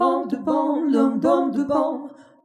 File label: Jazzy Harmony